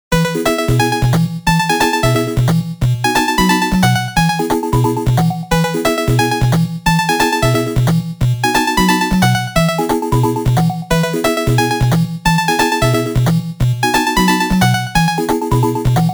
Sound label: bgm 8bit; maou